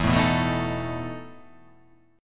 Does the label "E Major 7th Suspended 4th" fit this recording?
Yes